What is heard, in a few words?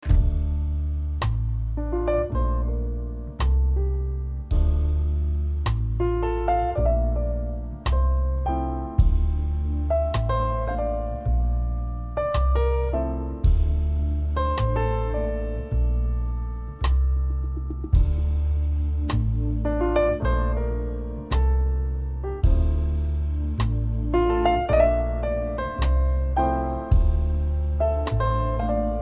smooth jazz